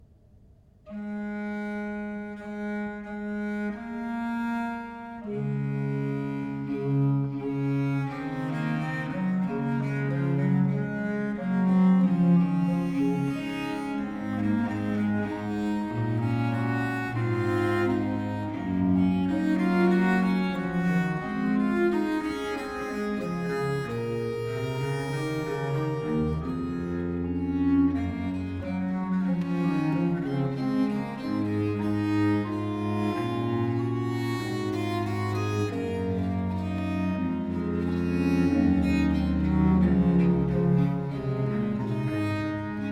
duo for two bass viols, in d major minor

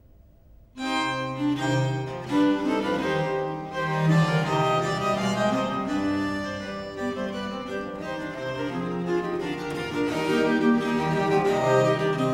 consort sett a 5 in c minor aire, viols

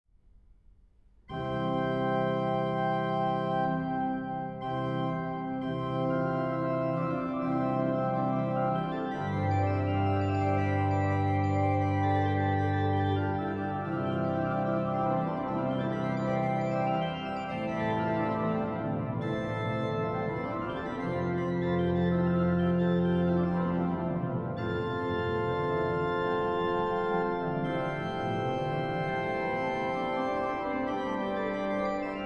intonazione del quinto tono; sacred choral; venetian easter mass

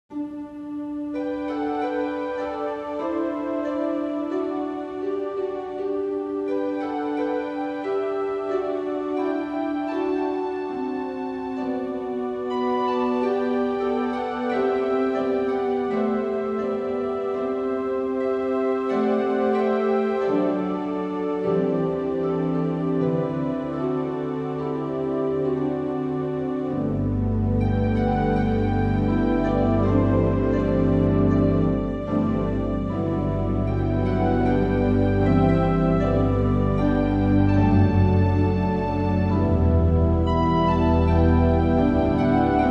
carillon

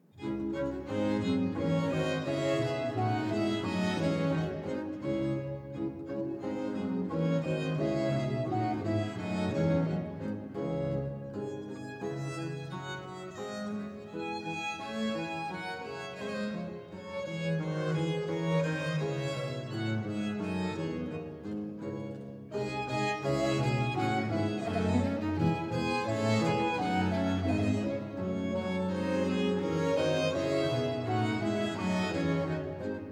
noels pour les instruments; joseph est bien marie; Boston Camerata

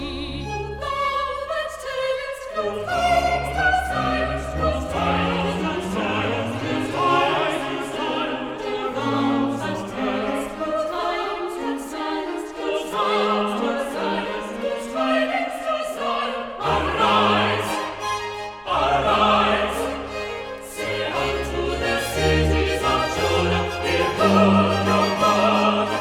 chorus; o thou that tellest good tidings; Handel Messiah

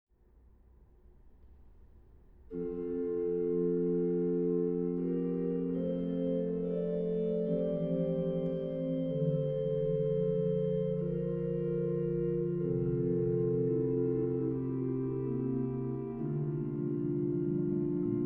Toccata prima del quinto tono; (excerpt)

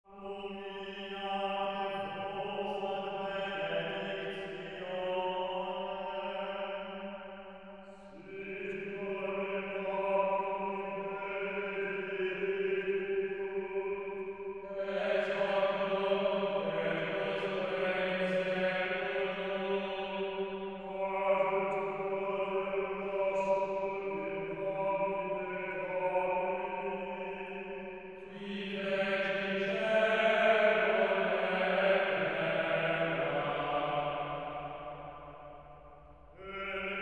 Benedictio chant; Humilitate vos ad benedictionem

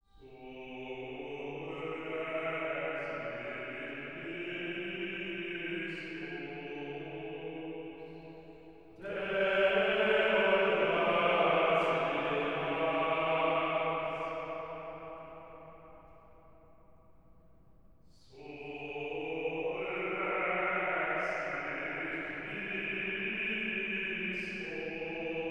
surrexit christus (chant); sacred choral; venetian easter mass

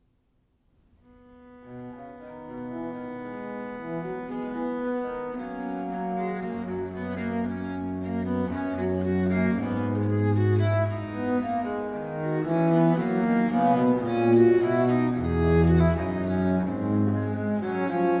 duo for two bass viols, in c major minor; early music

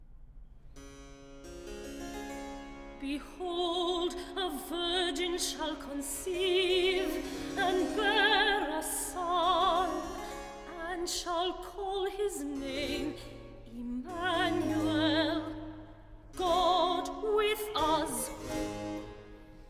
recit behold a virgin shall conceive

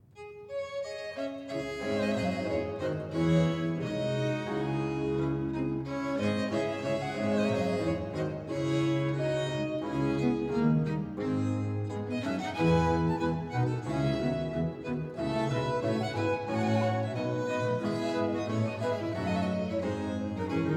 noels pour les instruments; une jeune pucelle; Boston Camerata

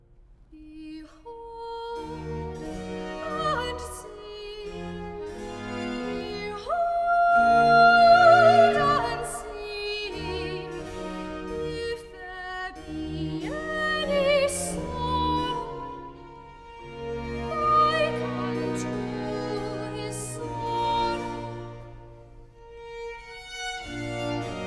air behold and see

